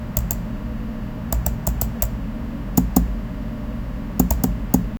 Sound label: keyboard typing clicks so